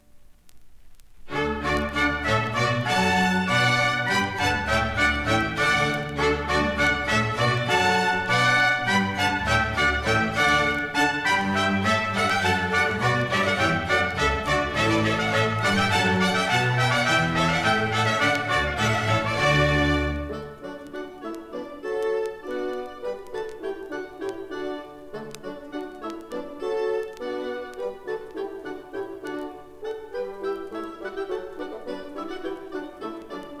Water Music; Handel; HVW349

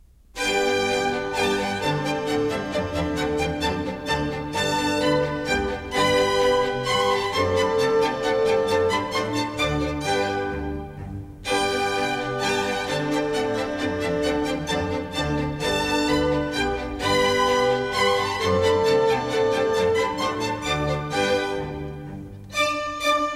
German Dances; Mozart; K605

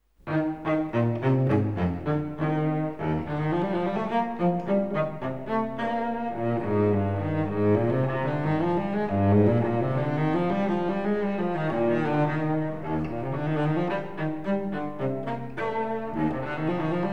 Bach Cello Suites; Cello Suites4; Tortelier

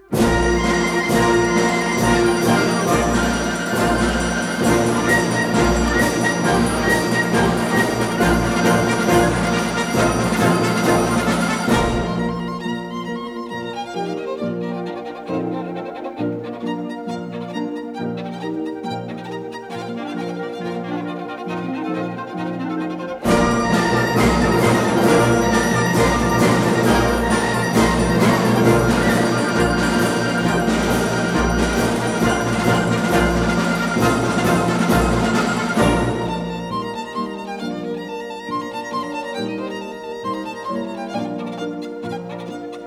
Capriccio Espagnol; R Korsakov; Markevitch

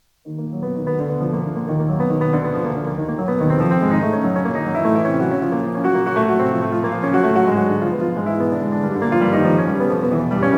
Preludes; Chopin; Op28; Lympany

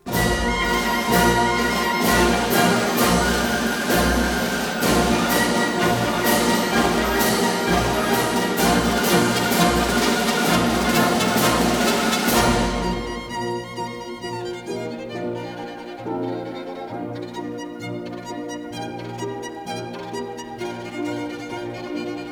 Capriccio Espagnol; Rimsky-Korsakov

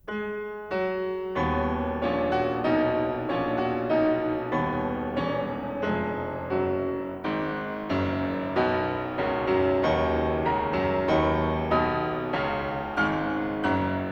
Pictures at an Exhibition for piano Promenade; Mussorgsky; Graffman

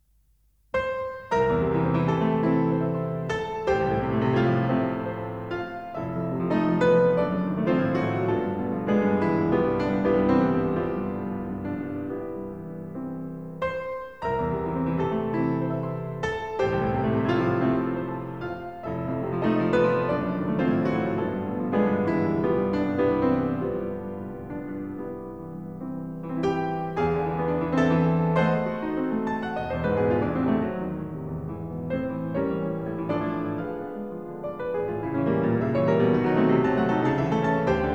Piano Pieces Intermezzo; Brahms; Op118; Katchen

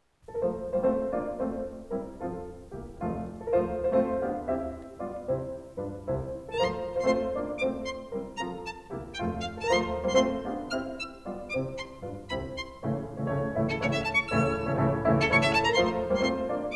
Violin Sonata; Beethoven; Violin Sonata No5; Morini Firkusny

